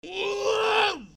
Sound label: scream, zombie screams